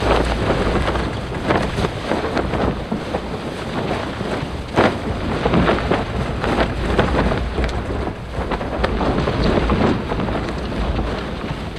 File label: cloth; LoL; emitters map12